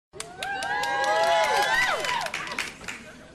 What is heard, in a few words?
wow nicely done, laugh track; disney channel nickelodeon